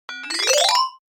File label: kirarin